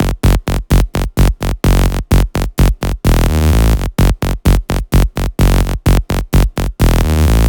128BPM Melody; Vengeance Samples; Glorious Kit

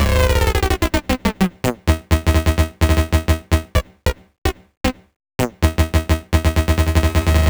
128BPM Tribal Kiss Melody; VFH1 128BPM Tribal Kiss Kit

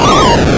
Arcade Riffs

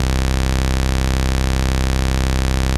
Basses C; Vengeance Samples

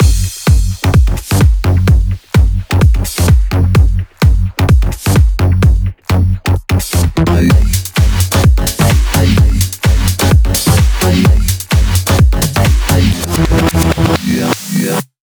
128BPM Mix; VFH1 128BPM Bones Kit

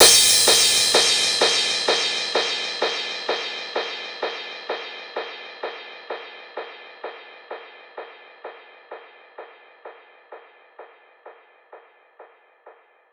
Crash, 128 BPM; Vengeance Samples; VTDS2 Song Kits; Vocoder Mother Earth

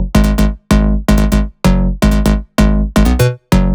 Bass 128BPM; VFX2 Demosong

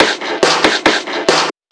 Breakbeat; Vengeance Samples; VEC2 Loops

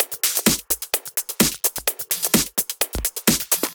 Drumloops 128BPM

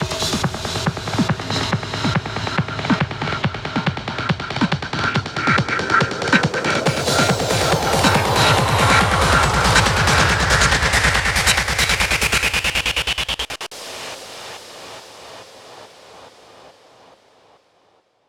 140BPM 8Bars, Hands Up Trance Fills; Matrix; Vengeance Samples

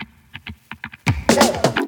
Minimal Fills; Minimal Loops

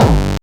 Bassdrums Hard Kicks; Vengeance Samples

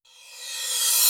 Cymbals Reverse Crash; Vengeance Samples

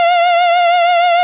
Synths C; Vengeance Samples

